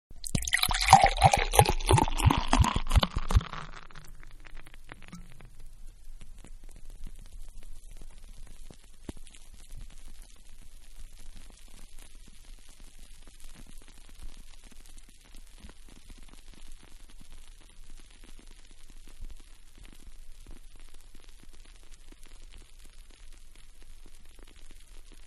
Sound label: Bottle Beer-Pour Into Glass; Pouring; Objects